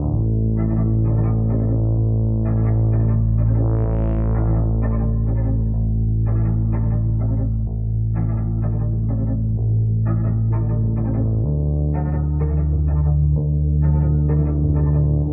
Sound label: keys and strings crucial time, sad loops